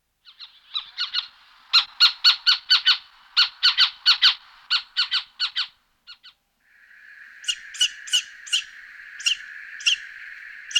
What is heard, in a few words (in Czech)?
Sycek obecny Athene noctua